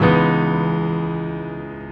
piano chords C#, vocal house; PS-28 Vocal House Samples

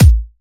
Kicks; Vengeance Samples

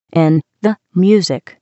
Vocal Phrases; Vengeance Samples; Vocals

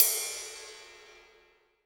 Ride, Cymbals

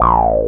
Synth Shots; Vengeance Samples

